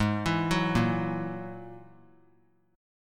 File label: Ab 7th Suspended 2nd Sharp 5th, 3C,3F,3A,3D; Ab7sus2#5 arpeggiated; JGuitar